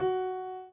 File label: piano